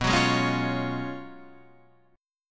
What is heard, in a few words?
Gb Major 7th Suspended 2nd Suspended 4th